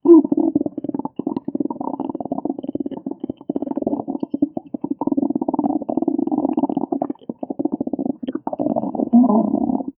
distinct rhythmic belly, gore

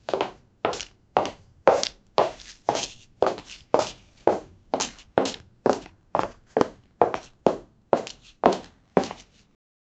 women school shoes walkin, indoor

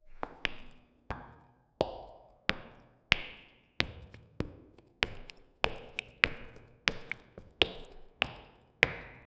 clear footsteps on dry, hallway

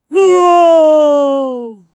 voice reaction for big wi; vocalizations

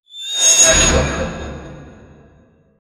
surprise

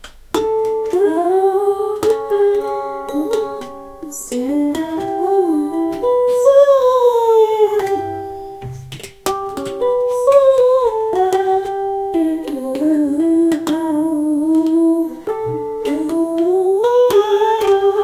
funny russian, world music

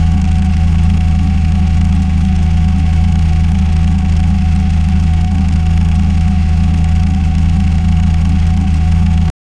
a clean realistic loop of, race car

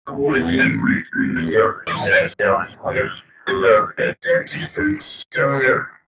anticipation build up

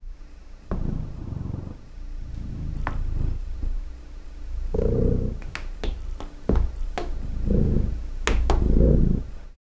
cat sneaking in kitchen; stealthy

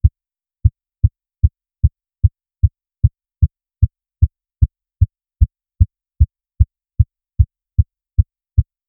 short quiet heartbeat

